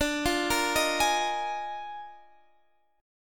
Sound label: D sharp Major 7th Suspended 2nd Suspended 4th D, chord